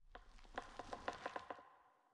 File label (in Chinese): 苍白垂悬苔藓声